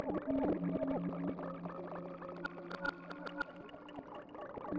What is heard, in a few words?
Warp Wave Drum; Ambient Cinematic; Rhythmic Atmospheres